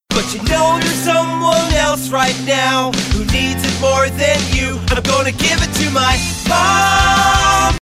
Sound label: Jingle; EM Voices